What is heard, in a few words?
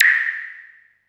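MISC Right, Techno 138 BPM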